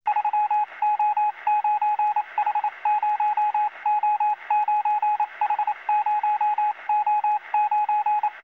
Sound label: Classic Morse Code; Electronics; Sony Pictures Sound Effects Series Volumes 1-10; Sony Creative Software